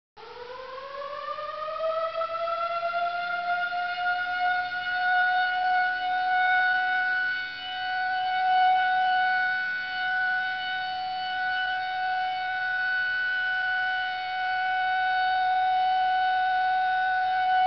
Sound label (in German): sirene 1 minute; Sirenen